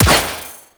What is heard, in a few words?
Pistol Impact Generic; Warframe; Sentient; Crp Sent Amalg Pistol